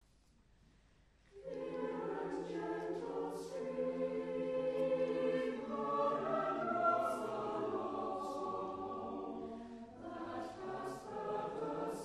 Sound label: clear and gentle stream, choir